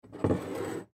Coffee Cup Slide, Coffee Cup Slide Scrape Counter Top; Household; Dishware; Blastwave